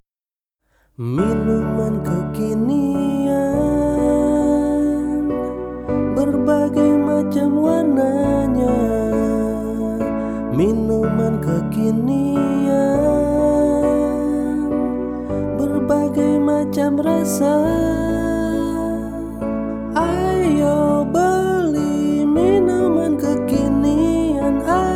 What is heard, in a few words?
Acoustic Piano